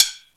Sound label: Sticks, Roland D-70; Drum Samples